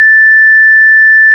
ORG VIP Flt 8' A 5, Farfisa Flts, ORG Farflt DBars; Keyboards of The 60's and 70's